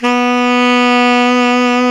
SAX TENOR; 4way; Roland L-CDX-03 Disk 1; Tenor V-sw

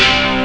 hall guitar; Amiga Soundtracker Sample Packs

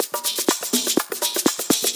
Dance Grooves

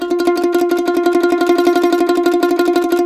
CEE TRILL F L, Blues Guitar

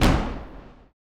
STOMPER; Spectrasonics Vocal Planet

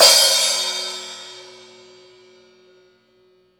crash; East Collexion; Drum 1 Dry

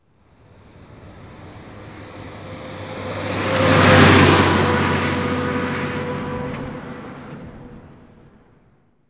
truck; AKAI S6000 CD-ROM - Volume 6; Transportation

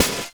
Drum & Bass, 140 BPM; Ueberschall; Drum & Bass Resonance

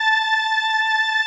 505, String Machines

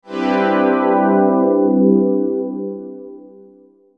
Digi Pads; Jungle; Jungle Frenzy 1

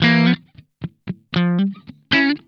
INTERVAL, Funk Guitar; Zero G - Funk Guitar

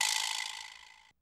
727 quijada, TR-727; Hollywood Edge; The Big Bad Beatmachine

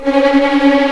C#4 TREM, VLNS TREMOLO, String; East West; The Ultimate String Collection

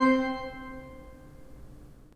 PIPE, 3DOrgans

